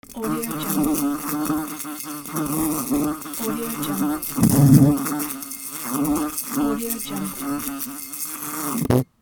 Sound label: fly in the bank